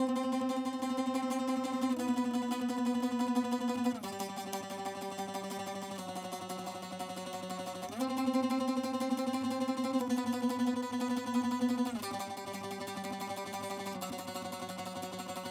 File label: saz